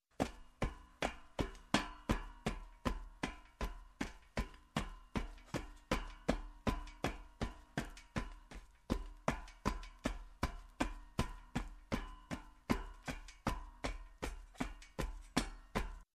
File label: footsteps steps boots